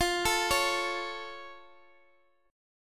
F Augmented, Guitar, Standard; arpeggiated; capo 2

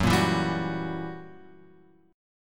F sharp 7th Flat 9th F sharp; F#7b9 Chord {2 1 2 0 X 2} strummed

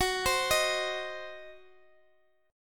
C Diminished F sharp, chord

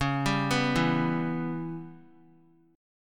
Db Minor 7th Flat 5th Db down; arpeggiated; JGuitar

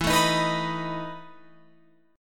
E Major 7th Suspended 4th, Guitar, Open F; EM7sus4 strummed

